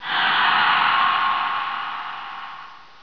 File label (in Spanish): grito